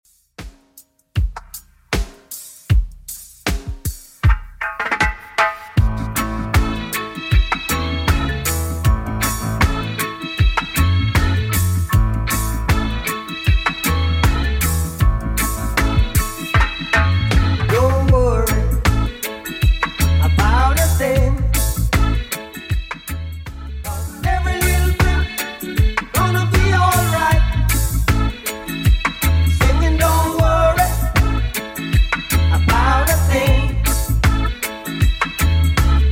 80s Redrum 78bpm

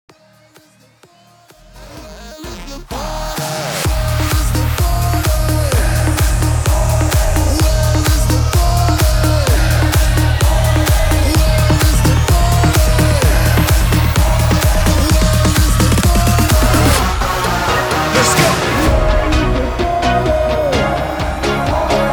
128 BPM

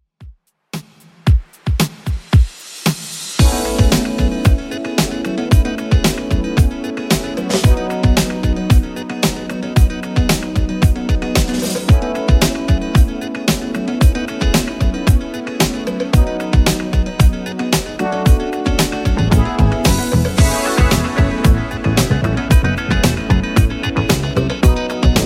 80s Redrum 113bpm

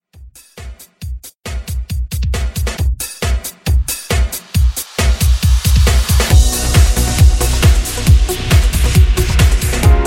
Trance Redrum; Remixfreakz

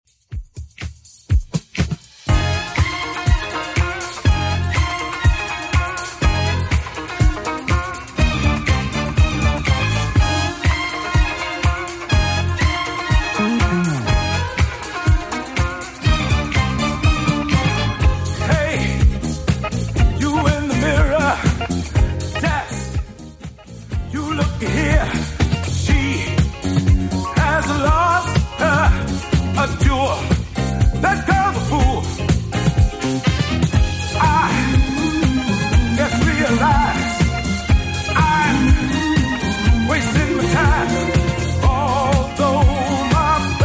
70s Redrum, 122 bpm

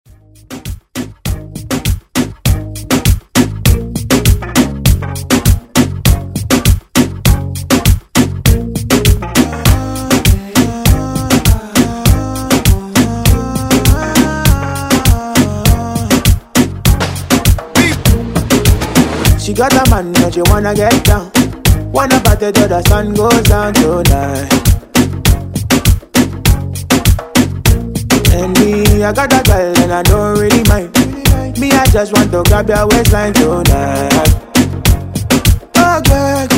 Afro Moombah; Redrum

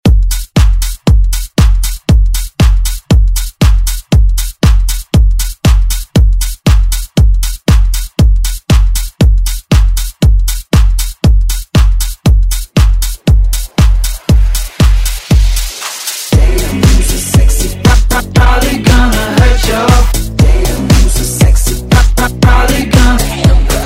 Pop Dance Redrum

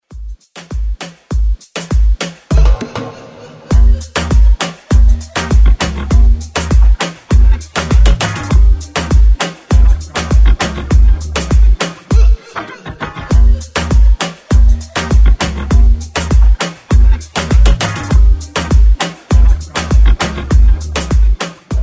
moombah redrums

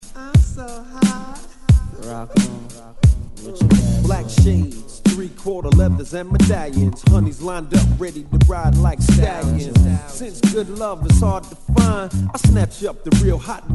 90s Intro 89Bpm

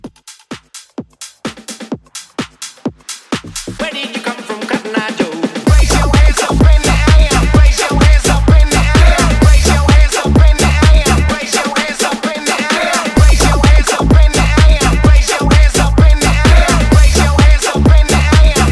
Hype, 128

